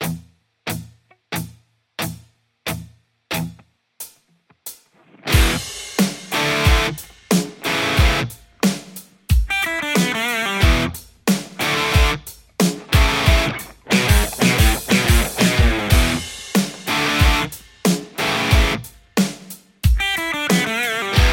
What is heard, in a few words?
strat; igniteamps